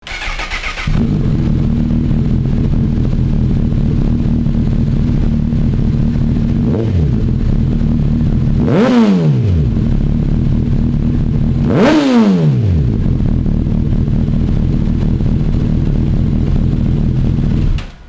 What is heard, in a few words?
CBR1000RR RT-1 Aluminum SlipOn, exhaust sound; honda; Jardine